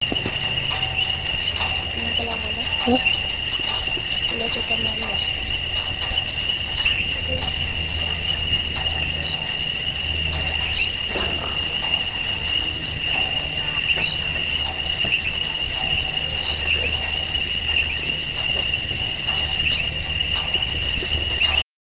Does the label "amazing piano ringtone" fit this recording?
No